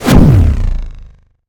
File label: player Decelleration; Space Wave Race